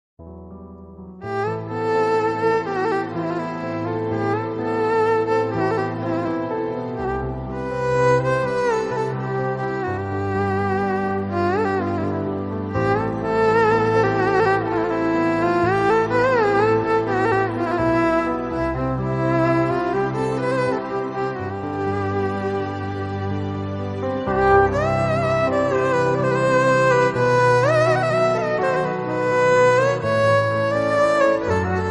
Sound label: instrumental, love ringtone, tamil love instrumental; kaala movie; rajinikanth